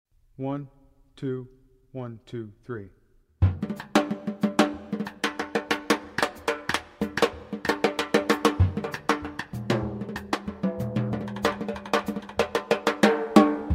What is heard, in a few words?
jazz percussion; 2022 jazz audtion